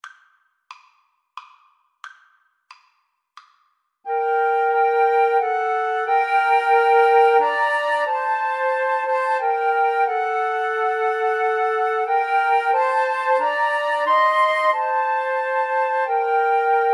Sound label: coventry, Flute Trio